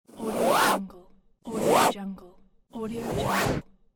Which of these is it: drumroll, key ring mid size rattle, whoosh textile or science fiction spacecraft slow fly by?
whoosh textile